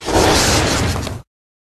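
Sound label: Dragon Skeleton buff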